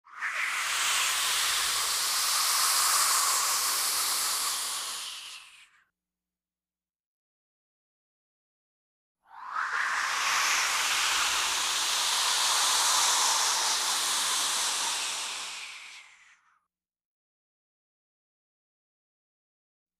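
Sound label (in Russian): человек имитирует свистящий ветер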